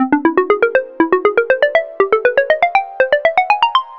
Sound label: Synth Textures